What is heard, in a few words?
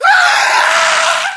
melee charge, voiceovers; english